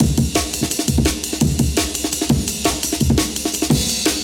Amen Break

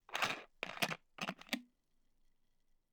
Bottle Plastic Unscrew Open Soft Drink; 96khz Mono; Zoom H4N; NT5

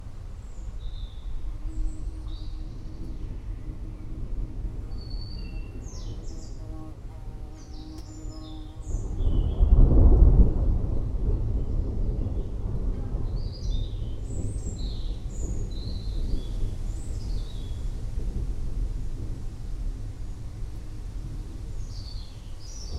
Thunderstorm

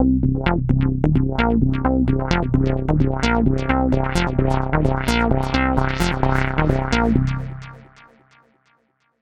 Bass loop 130 bpm B flat; Nucleus

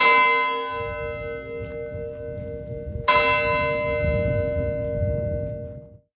Church Bells On Wind

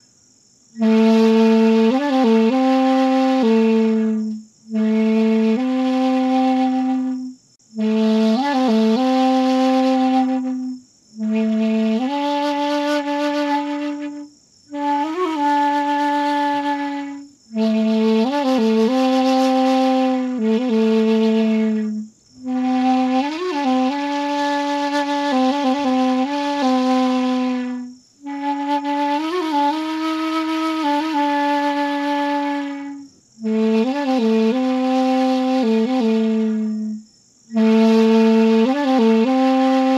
Flute

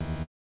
Ui Interface Negative

